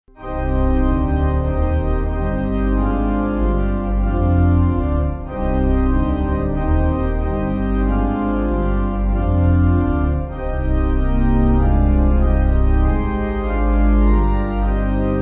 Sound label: Bell Pipe